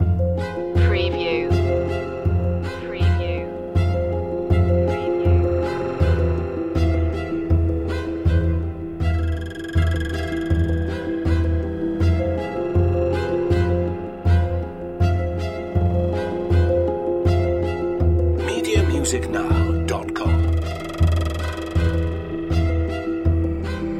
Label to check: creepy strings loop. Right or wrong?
Right